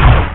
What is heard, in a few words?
rocket; ReaderGames; TankedUp